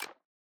camera click

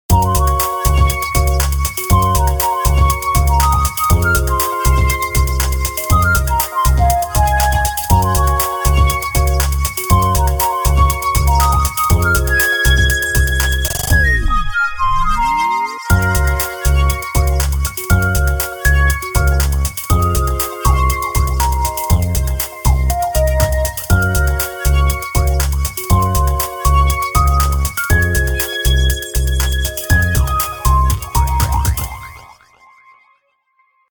Travel Flute, ringtones; sky ringtone

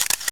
Shutter; LG800; camera

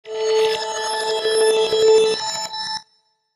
teleport, ringtones

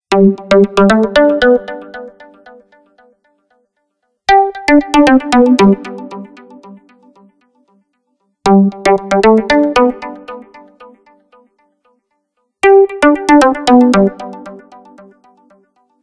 Ring Stretch; HTC Touch Viva WM6.5

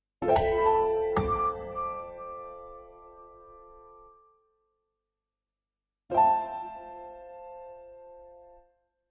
Calendar alarm; Nokia Asha-500

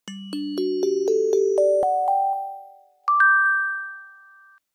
Bell; SKY IM-S610K

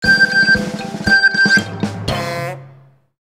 Kids message; Nokia; Audio themes

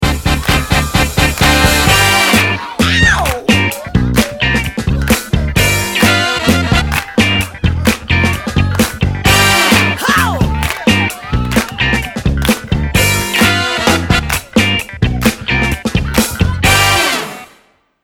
Funky Soul, ringtones

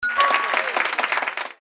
roulette

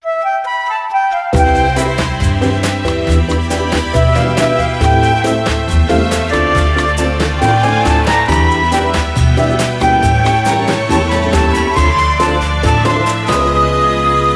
Elevator Bossa, ringtones; Huawei Ascend P6

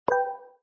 default notification chime; Samsung Galaxy S10; Velvet

